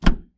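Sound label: open Kei car trunk, 軽自動車トランク開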